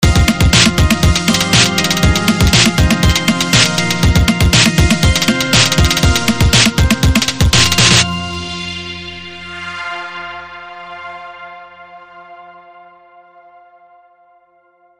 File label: Eastern Techno; Eastern Techno 2